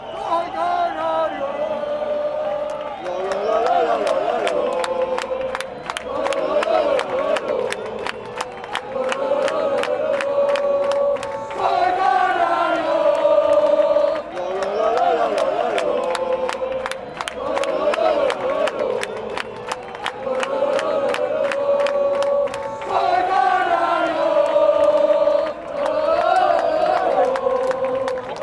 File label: chants; soy canario